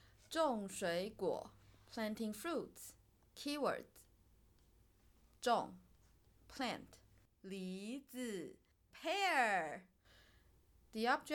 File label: Planting Fruits Mandarin